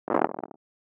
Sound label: floor sound, steel ball soil ground